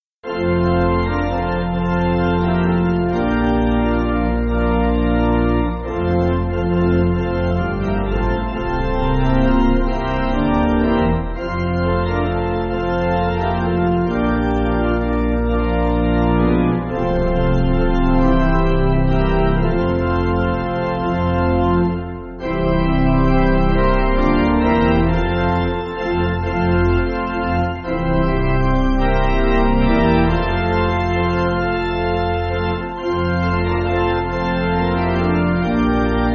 Pipe, Pipe Organ; Jesus, my Saviour, to Bethlehem came

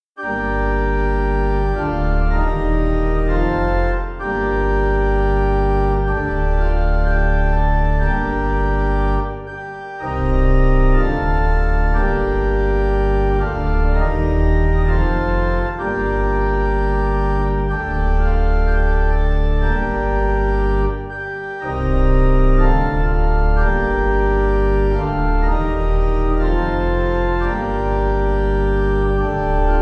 Agnus Dei Pipe; Communion; Dudman

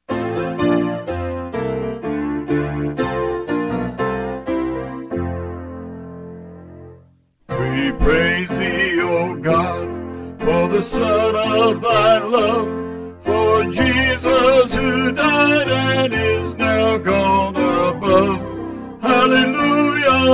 Vocals Piano; We Praise Thee O God

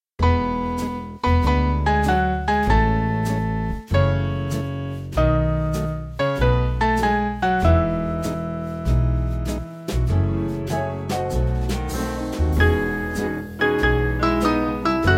Band

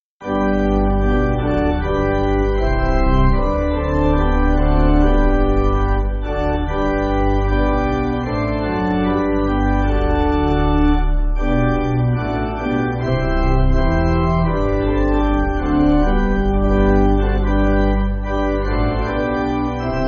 Jesus Hath Died, pipe organ; Devizes 8.6.8.6

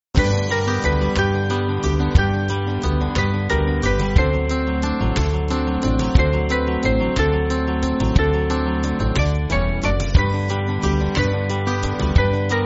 Band

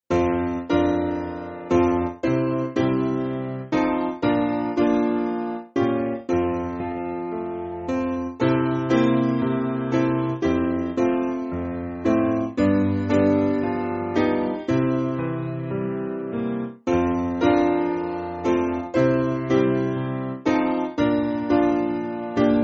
Piano, Music Backing Files for Churches; Living For Jesus; Lowden

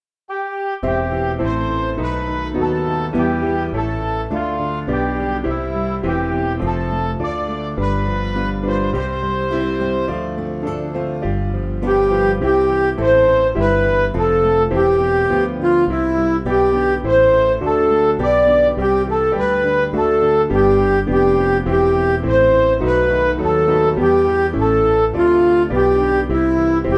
Music Backing Files for Churches; O splendor of God's glory bright

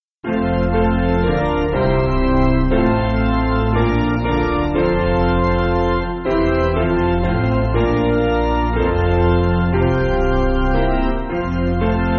Piano, Backing Files for Churches

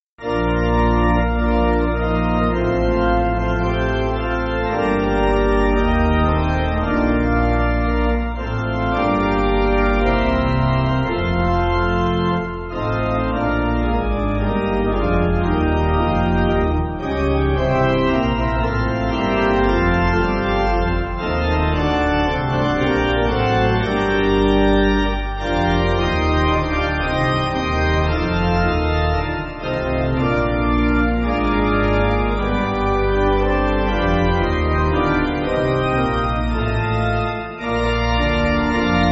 Let All The World, pipe organ

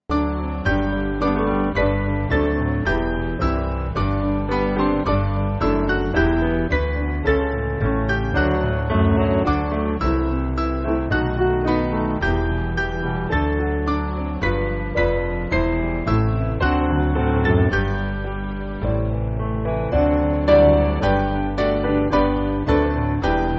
Piano; We Praise We; Church Triumphant; Mainly Piano (CM)

